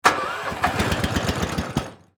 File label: Pressure Washer, Pull, False, Start, Gas, Powered; Landa; Machinery Sound Effects; BlastWave